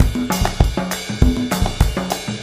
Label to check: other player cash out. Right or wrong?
Wrong